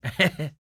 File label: LAUGH, Blues & Soul; EL SPOKEN